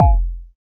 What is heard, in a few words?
Syn Swt Atk; Roland BK-5